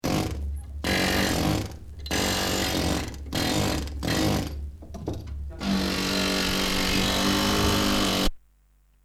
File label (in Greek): ΤΡΥΠΑΝΙ